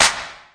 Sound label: urbantrap clap; Hip Hop; Urban Trap Kit; style kits